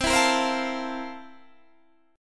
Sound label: C 13th C down Guitar Standard; C13 strummed